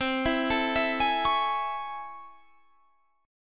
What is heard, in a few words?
A Major 7th C; arpeggiated; JGuitar